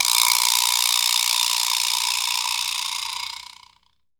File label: VIBRASLAP; Drums & Perc; AKAI S6000 CD-ROM - Ultimate